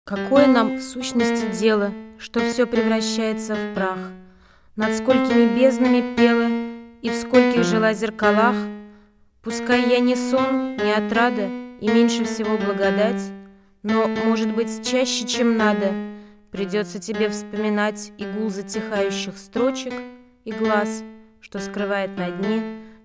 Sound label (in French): voix piano; Akhmatova